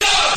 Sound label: "what" crowd; ooh ahh